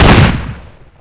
spas12; paranoia

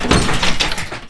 old door open

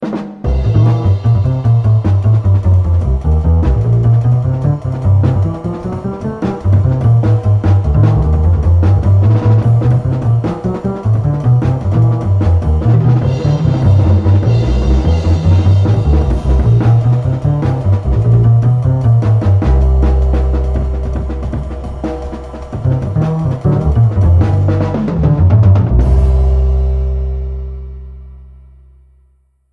drums

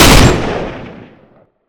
rifle fire

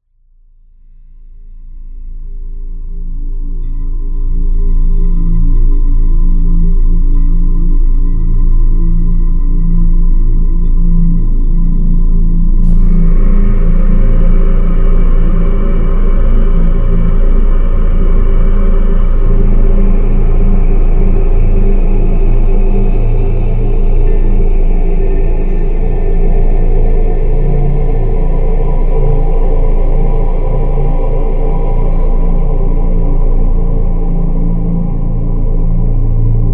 ambience; ragemap2024; grunt

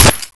glock fire

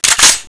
ump45 bolt pull

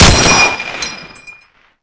M1 ping, weapons

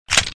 shotgun pump

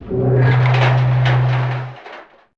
lift doors open

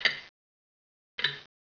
clock tick

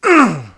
pain; police

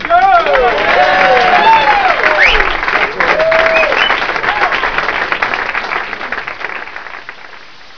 goal

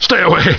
hurt

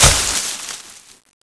vine

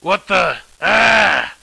shot

